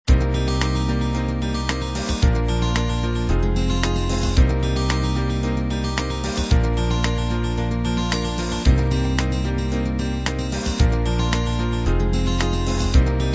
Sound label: soft beat; 8 beat styles with arpeggiated 16th note guitar; These 2 styles can be either used separately or combined to provide A, B, C and D substyles in one song.